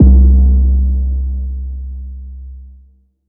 Tiếng bass kêu vang thùng ngắn

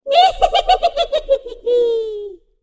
Tieng mu phu thuy cuoi hihihi

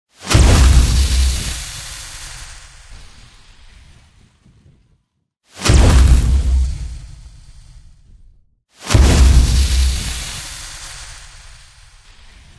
Tong hop am thanh tan cong ma thuat phep thuat

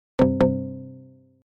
Am thanh nhac nho dang nhap tai khoan

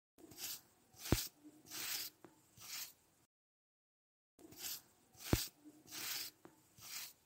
tiếng chải tóc bằng lược

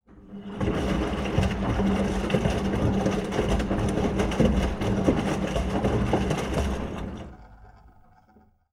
tieng may giat van hanh hoat dong

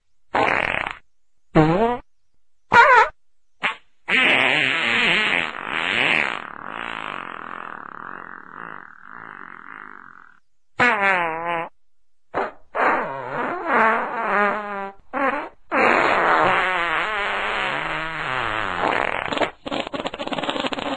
Tieng xi hoi

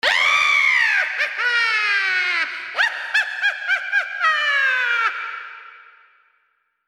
Tieng cuoi phu thuy

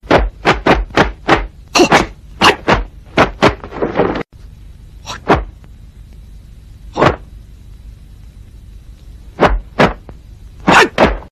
Am thanh luyen vo thuat

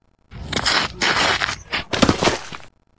tieng truot chan te nga sich xuong dat

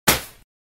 tiếng bắn pháo giấy ngắn

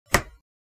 am thanh tieng cach

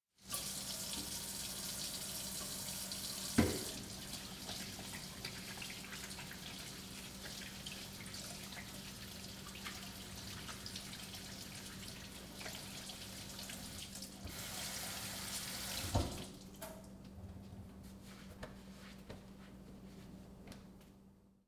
Tieng nuoc chay tu voi khi rua

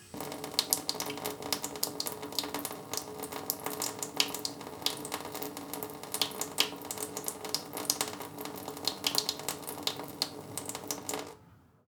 tiếng nước bồn rửa nhà bếp chảy chậm

